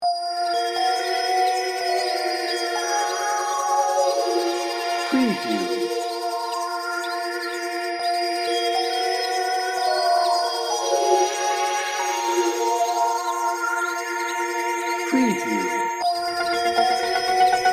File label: adventure beat no drums